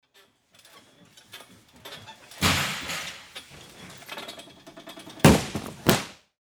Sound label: men high bar